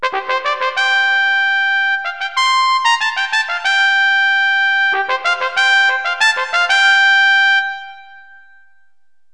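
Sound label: trumpet; Roland - An Invitation To The Roland World Of Music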